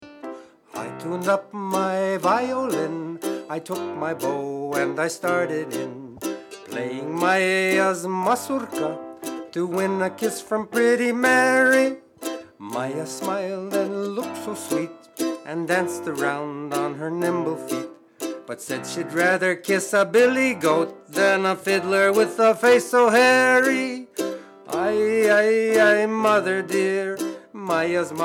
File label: mazurka